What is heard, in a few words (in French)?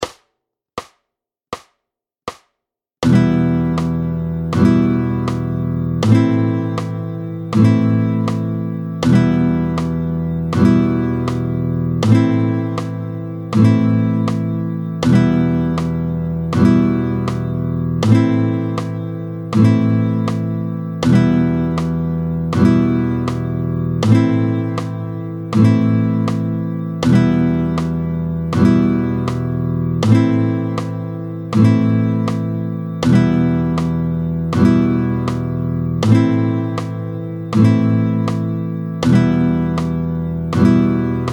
Blanches 80, Les blanches, battement tempo 80